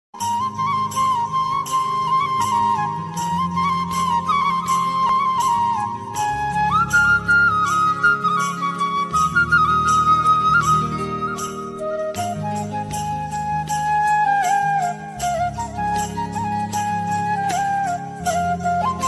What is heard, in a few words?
the best emotional flute ringtone marathi flute ringtone; for mom